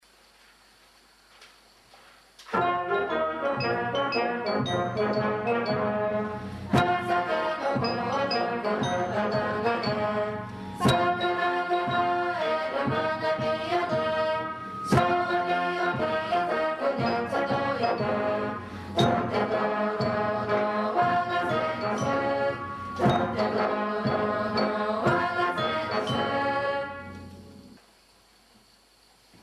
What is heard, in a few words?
cheering song one chorus